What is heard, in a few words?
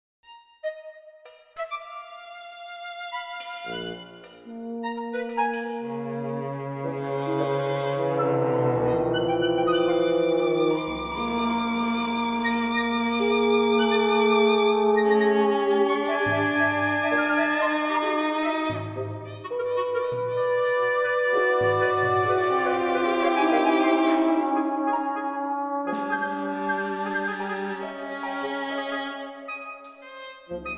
Trumpet Concerto 1st Movement